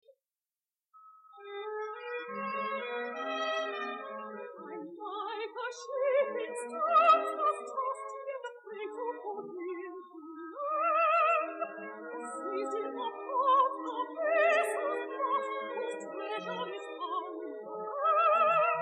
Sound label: air